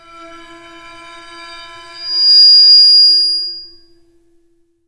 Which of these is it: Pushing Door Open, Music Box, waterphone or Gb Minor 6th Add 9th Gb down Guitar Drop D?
waterphone